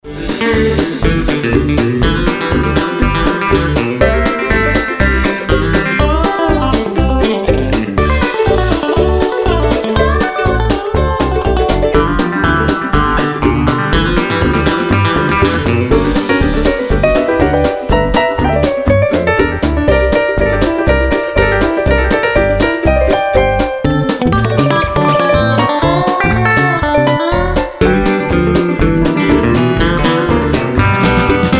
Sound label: Stomp; New Paltz